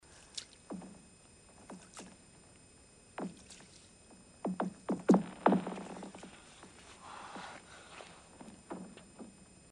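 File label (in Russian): от лунки треск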